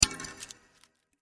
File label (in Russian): zvuki volshebnoi fei